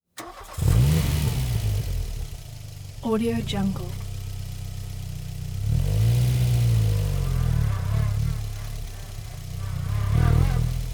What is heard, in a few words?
volkswagen rabbit front tires rolling and clapping wind whir